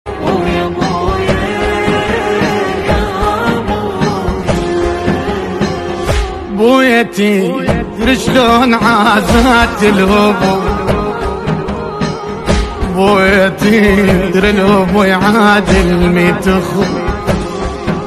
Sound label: arabi cafishop